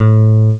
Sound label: pluck bass; Amiga Soundtracker Sample Packs